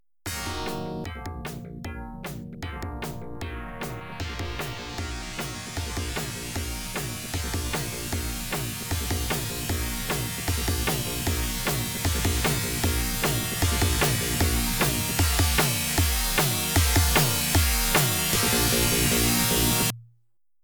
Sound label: Catwalk Timer, pinball